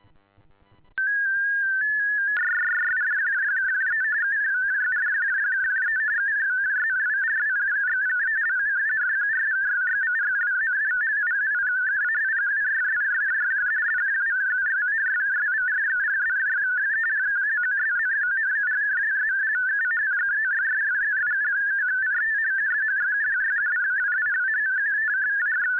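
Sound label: "ALE400" sounding "ALE400" incl. Reed Solomon identifier; sending an AMD (Automatic Message Display); ARQ-FAE 50 Bd / 8-FSK MODE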